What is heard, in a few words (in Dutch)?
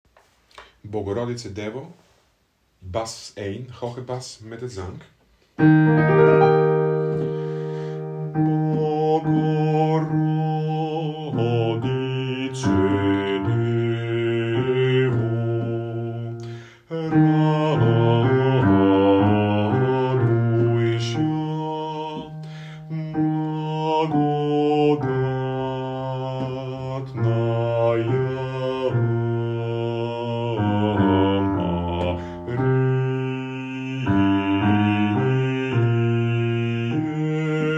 Bogoroditse Devo hoge bas zang